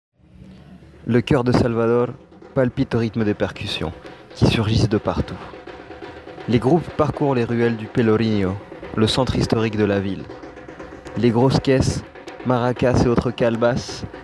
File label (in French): Salvador la ville tambour